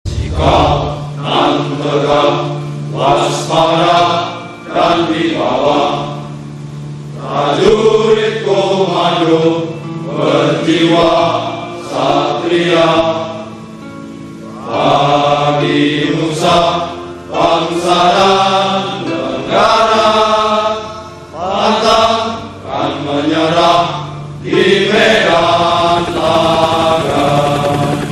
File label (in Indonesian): Himne Kopasus Ringtone